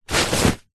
foley luggage rucksack backpack pick up from grass